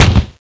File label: shoot, paintball; paintballav